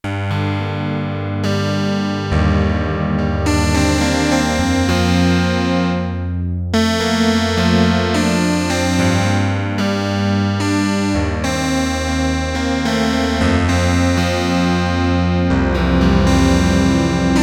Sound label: Korg DW-8000 CHORUS GUITAR, Factory Patches